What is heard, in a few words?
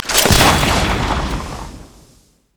quickdraw; LoL; yasuo skin01